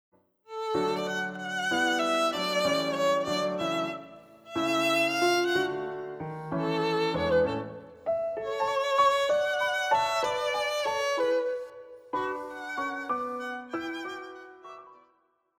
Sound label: Beethoven Violin Sonata; op12 no2 movt3; Violin Sonata No 2 Movt III